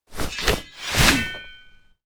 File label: comical